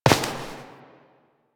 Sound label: Step; Warframe; Koumei